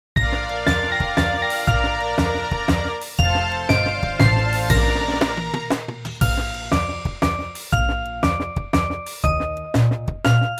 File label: karaoke